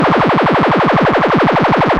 Loudest frequency 881 Hz Recorded with monotron delay and monotron - analogue ribbon synthesizer; instruments; loops